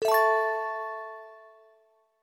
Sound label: item get sea